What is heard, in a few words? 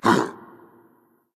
hitreact human male small